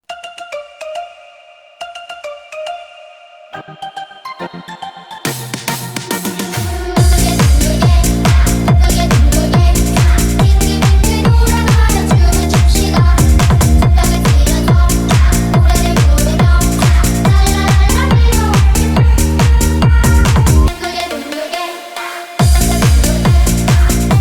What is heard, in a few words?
techno remix; Squid Game 2